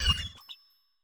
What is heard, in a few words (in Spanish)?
Grito de Frigibax